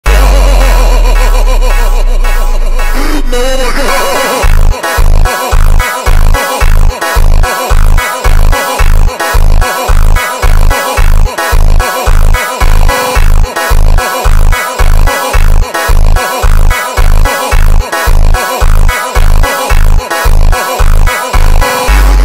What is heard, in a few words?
slowed brazilian phonk